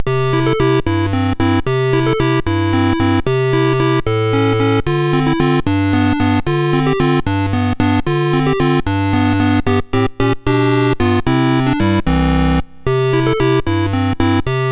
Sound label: sid MOS6581R2; Treasure Island